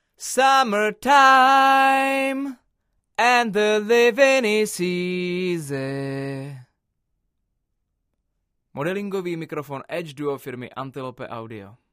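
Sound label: vocal, TLM103 cardioid; Edge modelled; edge duo srovnavaci test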